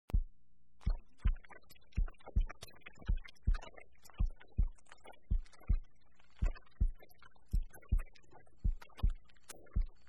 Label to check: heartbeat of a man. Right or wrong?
Right